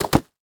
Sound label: Foley Footstep Soft Sole Dress Shoes Leaves And Twigs Debris Jump